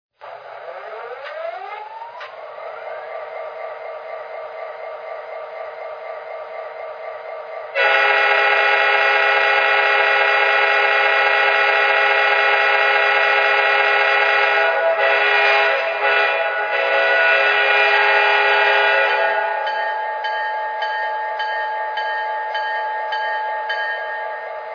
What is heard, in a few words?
f40ph